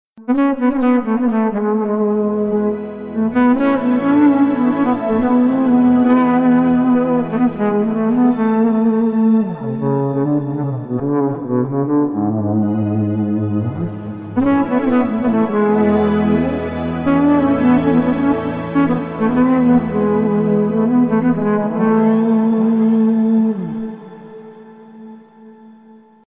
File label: duduk, guitar; пресета под именем synt flute; синтезатором; BOSS GT-6; Заодно услышите удачный пресет для соло; лично мне он больше напомнил дудук